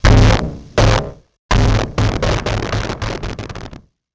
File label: Title Transition Distortion; Production Element Title Transition